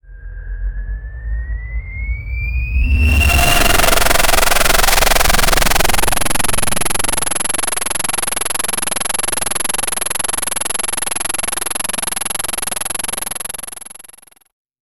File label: godzilla charging; monsters